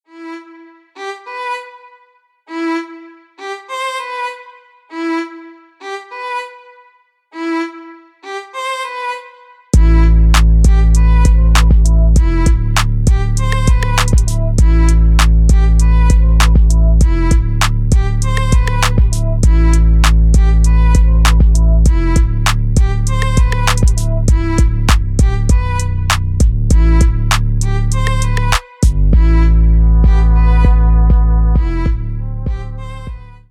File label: instrumental